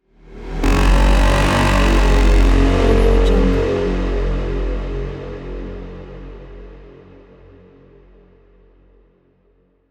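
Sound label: cinematic mega horns; soundmorph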